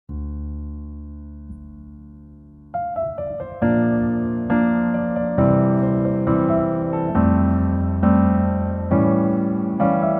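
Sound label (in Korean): Piano version 멜로디 MR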